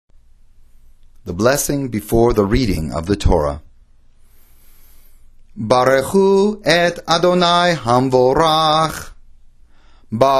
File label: blessing before torah chanted